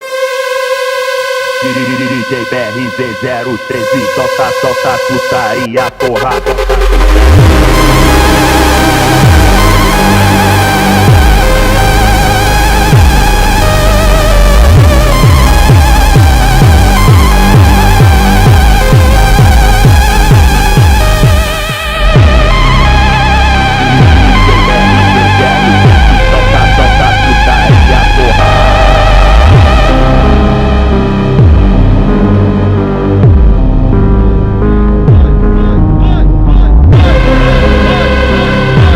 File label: Brazilian Phonk